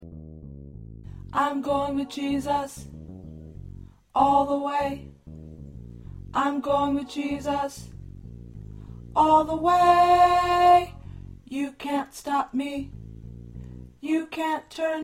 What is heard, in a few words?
Tenor